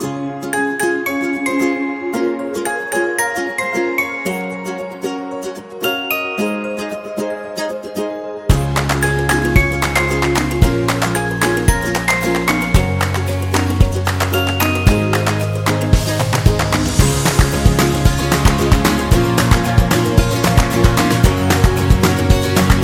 Happy Happy Birthday, happy music; happy music vol 9

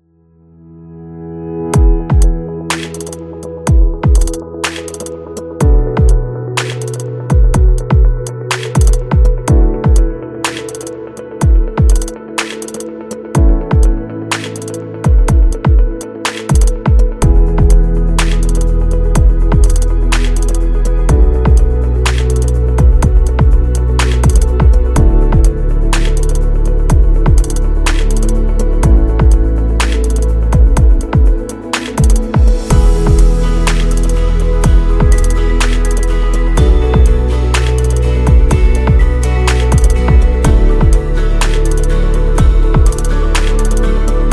Wellness Ambient; waiting music

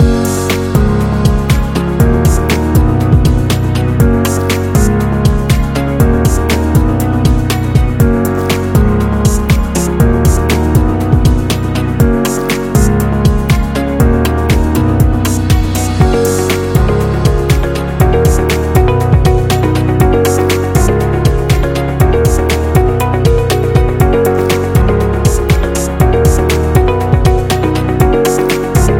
A Positive Loop

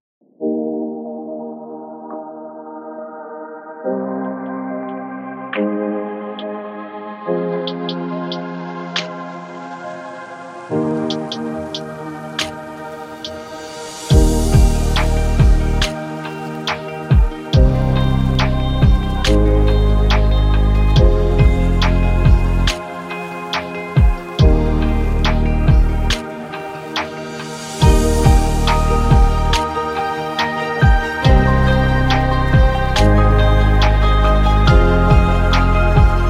Corporate Lofi

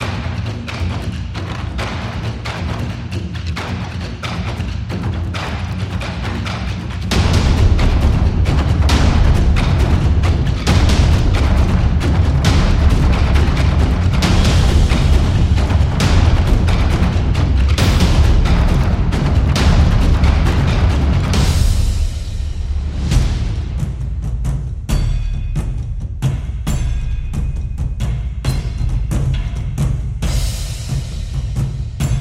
Taiko Japanese Action Samurai; sounds of the world

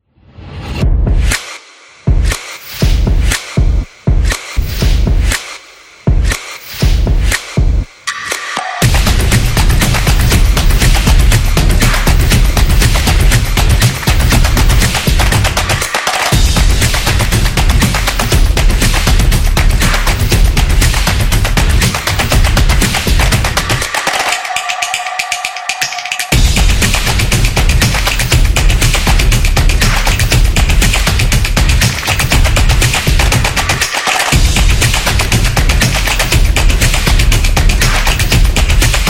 Product Ad; stomp and clap

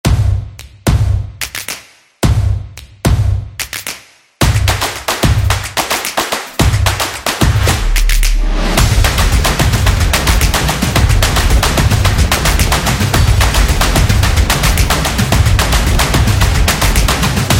Clap Energy

